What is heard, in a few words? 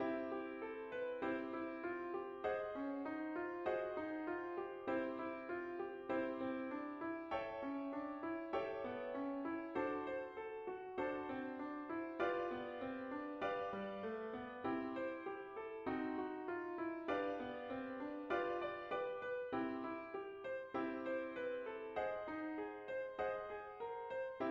Sequence Diatonic Chords Sequence Improvisation, trumpet